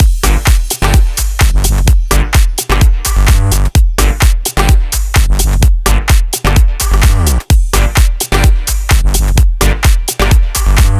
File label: 128BPM Mix; Vengeance Samples; Hopper Kit